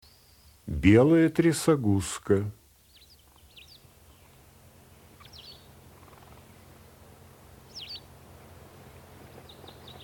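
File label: white wagtail voice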